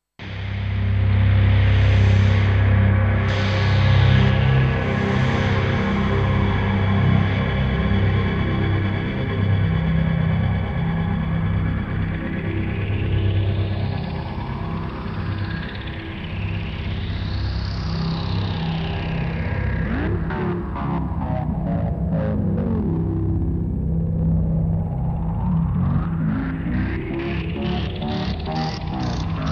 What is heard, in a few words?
Distortion Paradise